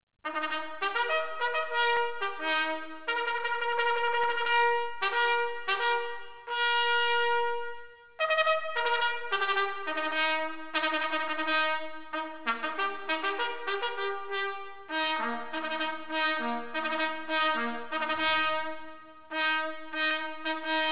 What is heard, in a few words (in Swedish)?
tillhast, signaler; dragoner